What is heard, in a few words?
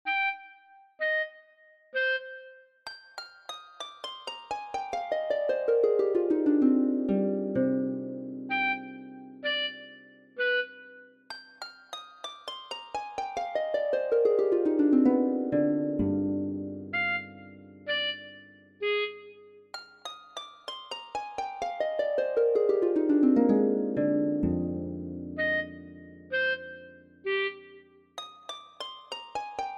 raindrops fantasy for orchestra